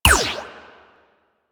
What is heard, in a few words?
Shot; Warframe; Tenno AR; Prime Tenno AR